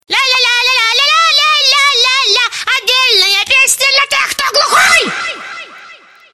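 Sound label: Song for the deaf!!! (girl with no head); Pesnya dlya gluKHIKH!!!; devochka bez bashni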